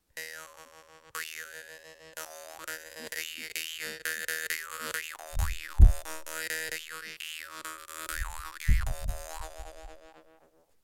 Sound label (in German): danmoi standard